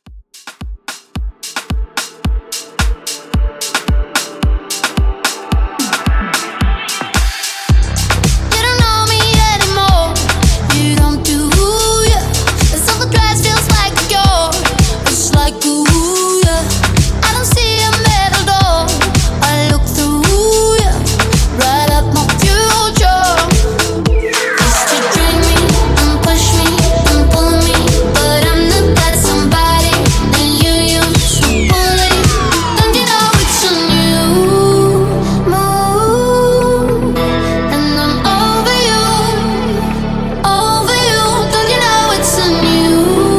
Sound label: Top40 110 Bpm; Redrum